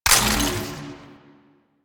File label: Ammo Pickup Magnetic; Ammo Ability; DE; Warframe; Frumentarius